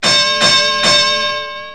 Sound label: Boxing Bell Start Round